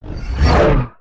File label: asteroid whoosh by